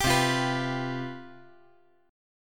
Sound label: C Major 7th Suspended 4th, down